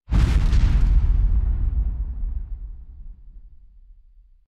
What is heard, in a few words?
Giant Step